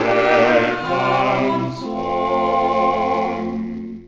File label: quartet test